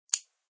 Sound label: Small torch lighter lit; Flick Sound Effects; Objects